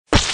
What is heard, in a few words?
Splat Sound Effect